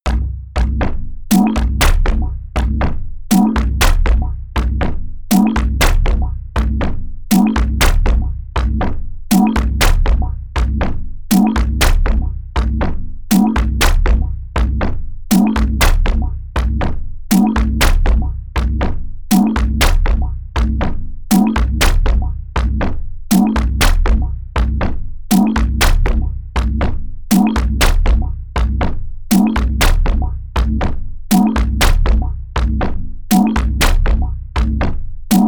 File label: sequence funny synth sequence